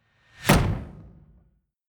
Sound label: incoming metal spike